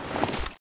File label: m14 draw; m14 falcon